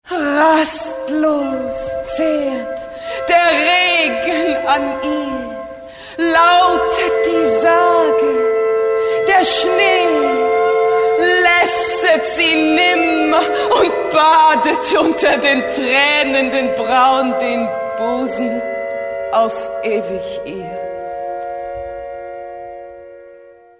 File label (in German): Songs, frauen; sprecher; Festspiele